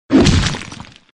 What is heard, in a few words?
Sfx Enemy Golem Attack